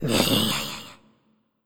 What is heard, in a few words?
Skel Soldier Strike Vo